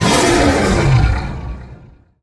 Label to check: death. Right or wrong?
Right